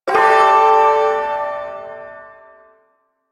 Shawzin Pent Maj Chord; Warframe; Duviri Ersatz Shawzin

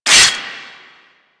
Bird Three Scratch; Warframe; Ambience; Entrati

